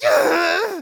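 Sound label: Scout pain severe, German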